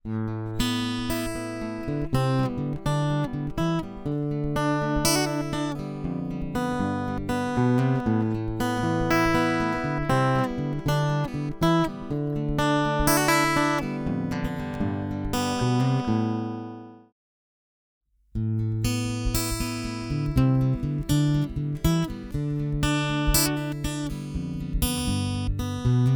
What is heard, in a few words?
BodyRez; tc electronic bodyrez